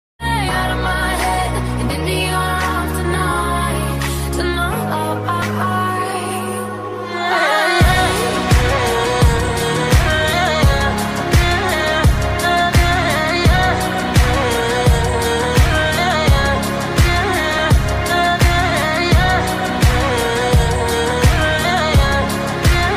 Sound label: ringtone bgm beats